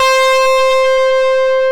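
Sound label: C4 distortion guitar; AKAI S-Series CD-ROM Sound Library VOL-8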